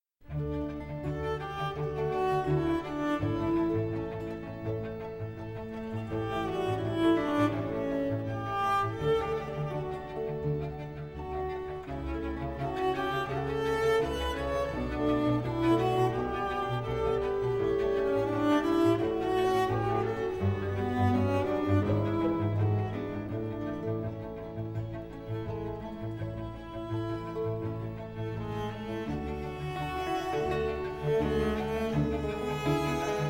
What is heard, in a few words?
Violin Concerto in F Minor, Largo; The Four Seasons, Violin Concerto in F Minor, Op. 8 No. 4, RV 297 Winter; II. Largo